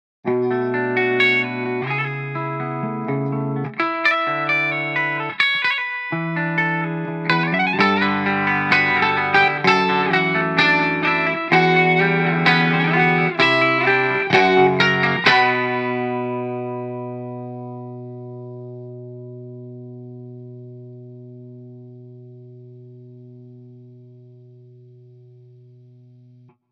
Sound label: lpc arpeggio test